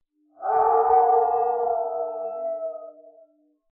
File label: distant howl